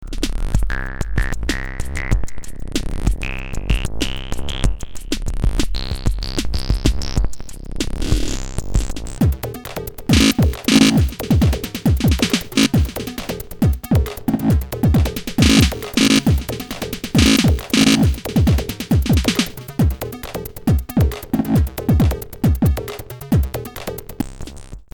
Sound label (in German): schneller seq